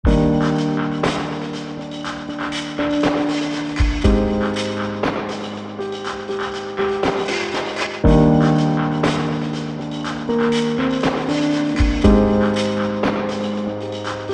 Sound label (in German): Electronic Grooves Piano Unbearbeitet